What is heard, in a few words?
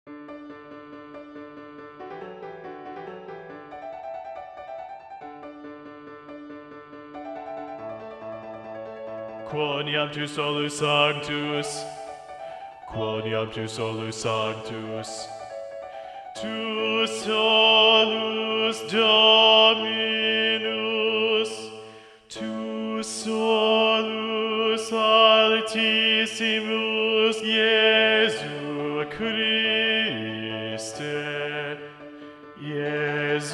Bass Predominant; Gloria - Vivaldi; Quoniam tu solus sanctus (Gloria)